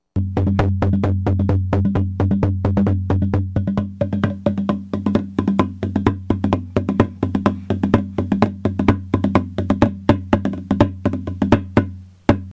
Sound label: kamitaiko